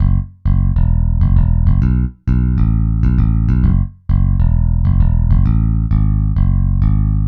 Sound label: Roundwound Bass; Dr. Fink's Funk Factory